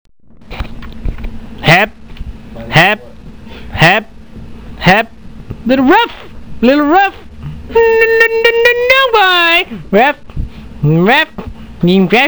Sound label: dog